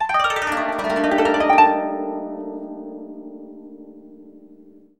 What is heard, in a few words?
KOTO ARP